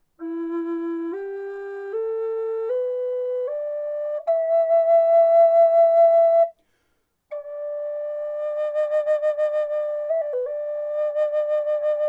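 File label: natural, wood flute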